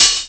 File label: muted hat sound sample c key; hat samples